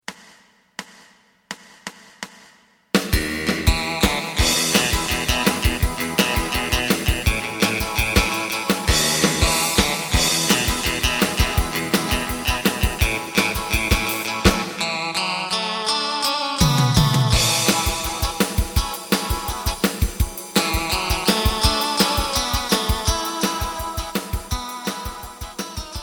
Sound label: bass; エレキバンド用カラオケ